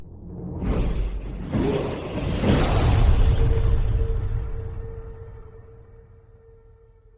level up; skyrim

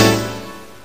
windows 95 error

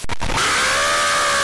jumpscare sound; five nights at emoji

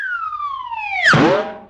slide whistle fall and boomp

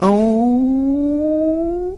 ooohh; cat; puss in boots

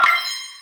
critical hit; battle cats

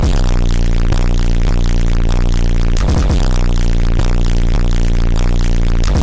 bass distortion

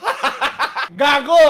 hahaha

